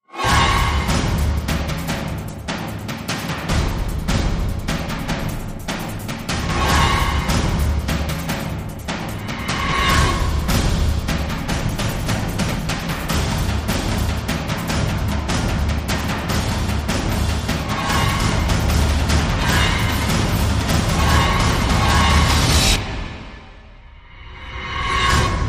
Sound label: Drum Riser